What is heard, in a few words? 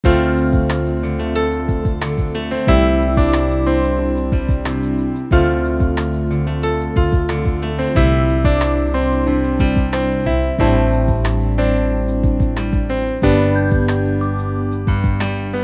Piano Orchestrations